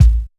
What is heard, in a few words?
punchd kick